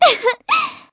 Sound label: Laugh Safe; Zelda laughing, safely caught by Link's Loftwing; Skyward Sword